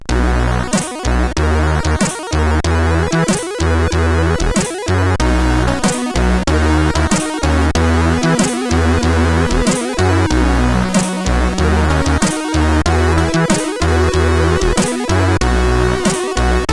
sid CSG8580R5

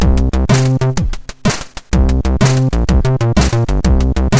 intro, MOS6581R2

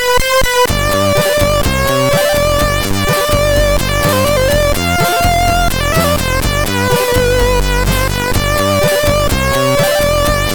Mikie Melody, sid CSG8580R5; hvsc

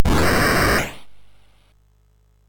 Dragons Den, MOS6581R3

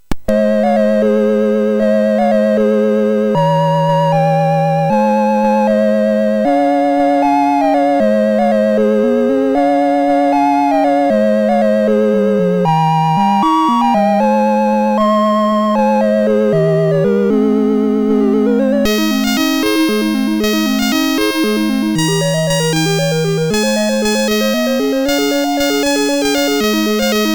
Silent Night, MOS6581R4

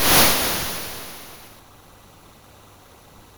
Taipan sfx, MOS6581R4

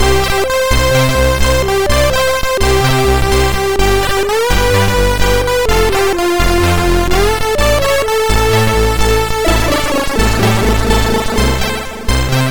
Music Samples, sid, MOS6581R2

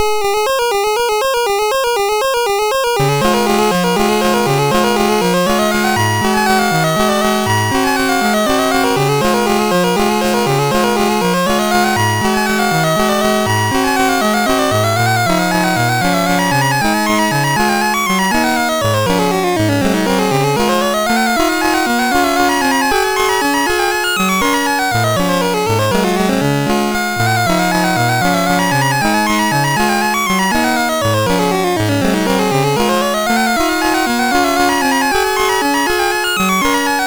Minute Waltz, MOS6581R2; sid

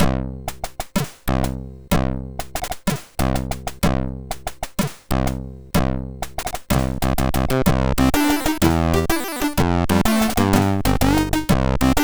MOS6581R3 sid

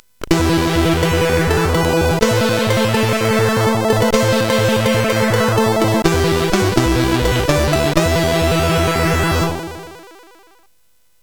Jingle, CSG8580R5

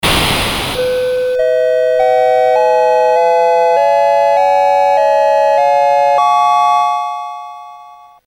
BASIC sid CSG8580R5

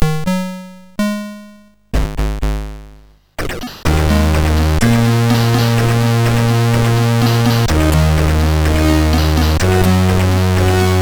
sid, MOS6581R3

